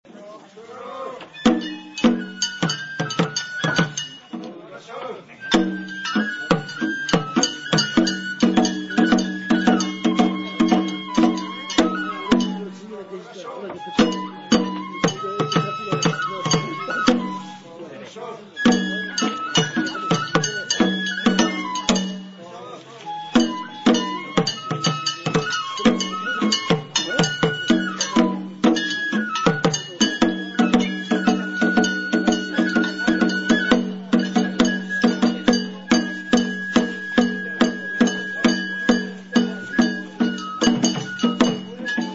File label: banjo